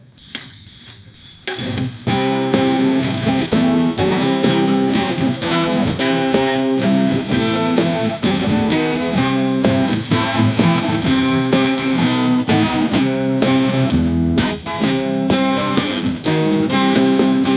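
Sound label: trash can rock band ending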